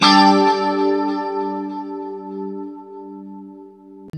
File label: 2CHD G 4 -R, Guitars; Spectrasonics; Hans Zimmer Guitars VOL-1; JC-SHEEN